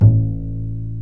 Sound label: Plucked Bass A2, Upright Bass Plucks